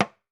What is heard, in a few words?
TAM TAM, African Drums; E-MU Formula 4000 Series Vol. 4; Earth Tones